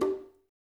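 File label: conga, left; Spectrasonics; Liquid Grooves 1 & 2; CONGAS+BGOS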